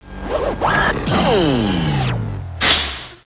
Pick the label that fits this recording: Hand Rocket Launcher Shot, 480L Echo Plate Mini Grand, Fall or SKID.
Fall